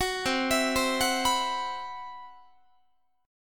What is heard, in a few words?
C Major 7th Flat 5th, Guitar, chord; CM7b5 arpeggiated